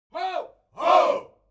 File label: human crowd military shout ho; smartsoundfx